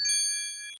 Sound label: has attribute; fenrir